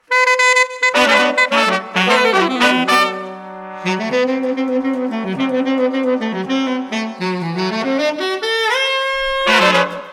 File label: PROGRESS BRASS END